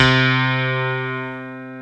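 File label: YAMAHA CP70 C2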